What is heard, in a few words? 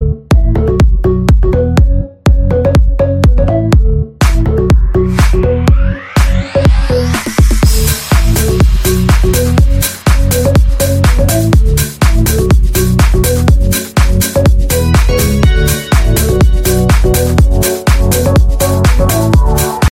cool beat; samsung s8